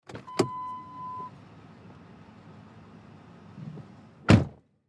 Police Car Interior, Police Car Interior Door Open Close; Emergency; Emergency Vehicle Sound Effects